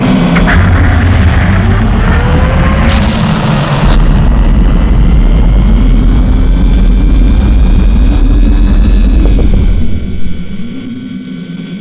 dropship landing; ambience; dropshipx

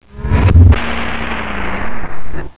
meteor impact; ambience

